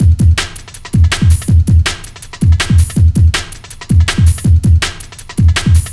162, breaks; m8 breaks

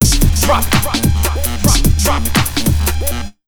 AMBIENT 147, Big Beat Drumloops

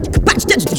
vox fx; VOX FX2 KIT; AKAI; Big Beat Samples & More

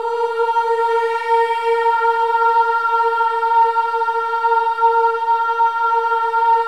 OH EH; Spectrasonics

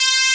PWM HP50 C4; Analogon

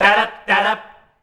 DARAB F, MALES; Best Service; Hyper Dance

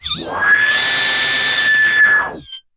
SCREAM G5, Yamaha VP-1; Music Store; SCREAMSYNTH1

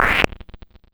Scratchy Clap, drum machine; 300 Drum Machines; DevSnd

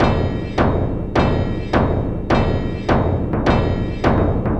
Industrial Loops; 104 WORK

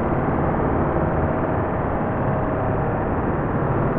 sparse soundscape samples; ReLooped

